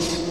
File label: turn; Tron3d